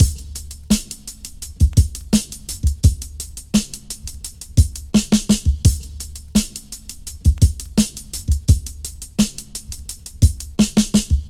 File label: Bonus Drum Loop BPM 100; Gully Loops